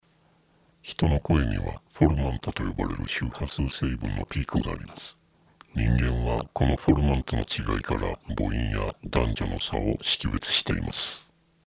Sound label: formant test